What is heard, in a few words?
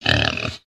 Male pig grunting